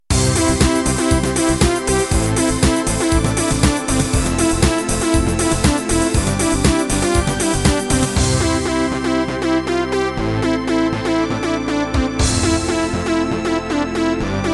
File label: Tekno End